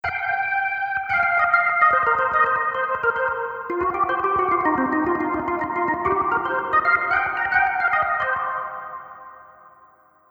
Organ stereo; 25sec 50ms

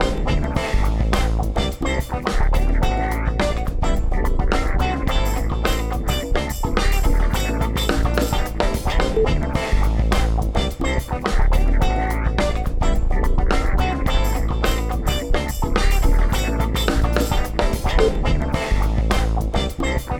Dave Hill Designs Titan NORMAL FAT NORMAL AIR

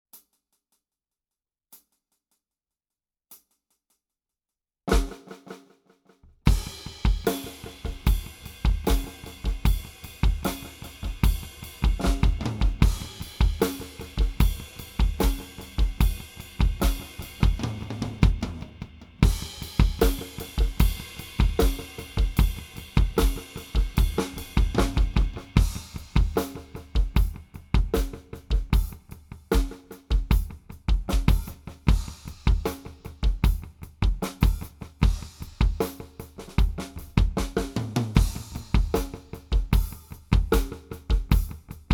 Yamaha EAD10 8th Delay

